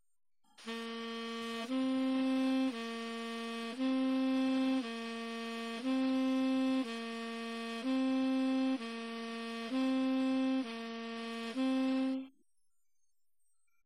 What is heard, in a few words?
C D tenor